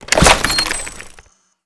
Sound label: pickup laptop; intelligence; Call of Duty Modern Warfare